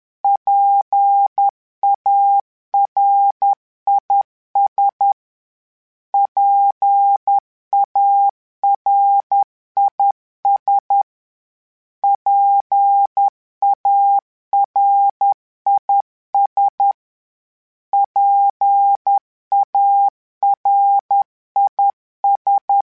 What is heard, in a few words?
PARIS, Morse Code; HamRadio; PARIS Calibration